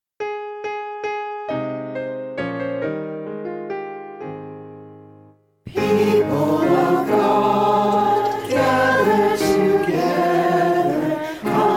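hymn of praise with brass small piano no flute no guitar; people of god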